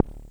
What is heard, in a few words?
KLONE POP, Klone Dual-Percussion-Synthesiser